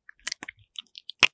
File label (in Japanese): くちゅ音